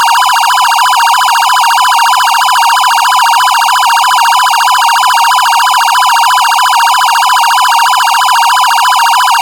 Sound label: SUPER HILO PIERCER, LED warning bar; UltraLITE Plus Exterior LED Warning Bar